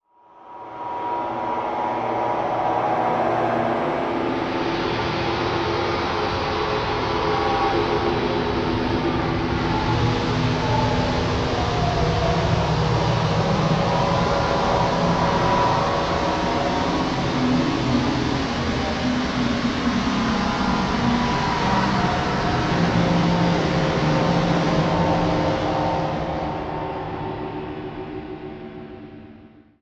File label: Slow Phasey Pitch Swells; Module Production Element Title Imaging; Imaging Elements